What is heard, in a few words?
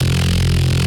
bass dnb f